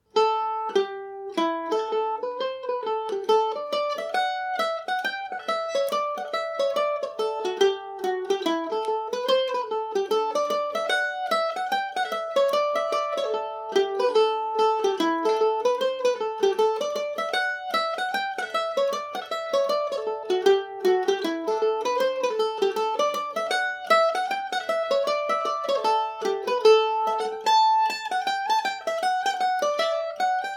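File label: A dorian, Irish mandolin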